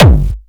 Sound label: Attack Kick